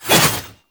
Void Heavy Scythe Whoosh Small; Warframe; Duviri; Incarnon Adapters; Melee

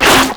Infested Runner Arm Attack J; Enemies; Abilities; Warframe; Proto Infested

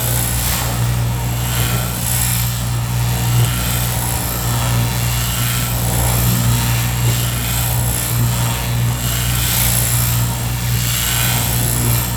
Stadium Stage Floor Light Loop; Warframe; Ambience; NineteenNinetyNine

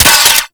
Hand Rocket Launcher Mech; Warframe; Corpus

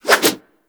Axe Small Spin Swing; Warframe; Weapons; Swings

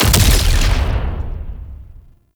Turret Projectile Explosion; Duviri

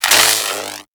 Lightning Rifle Spark; Warframe; Corpus

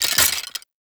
Bone Effigy Destroy; Gameplay; Warframe; Tau; Destructible Dynamic

